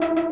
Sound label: synth